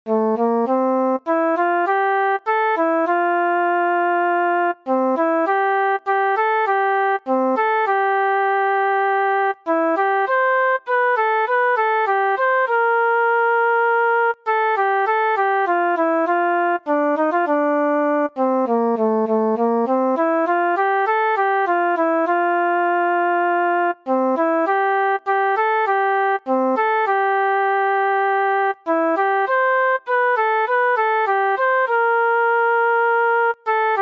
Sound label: Flute First Verse